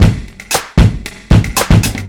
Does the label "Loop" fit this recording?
Yes